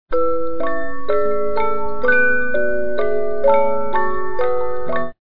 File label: Eine Kleine Nachtmusik Minuet, music box 50-Note